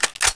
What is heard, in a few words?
m249 cover up